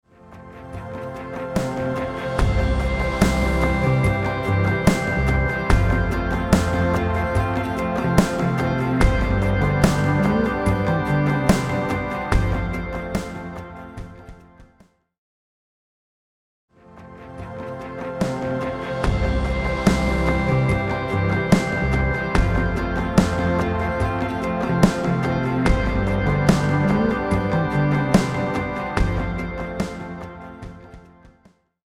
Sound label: EQ Tape Warm Bus